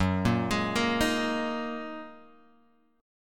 F sharp 6th Add 9th F sharp Guitar Open G

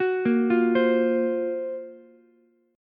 F sharp Major Sharp 11th F sharp Ukulele C6; arpeggiated; JGuitar

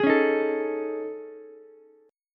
A Major 7th Flat 5th, Ukulele; AM7b5 strummed; JGuitar

